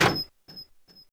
Cage Open; Princess Toadstool

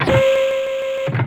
Cannon

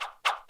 Hammer Throw

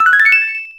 Item Float

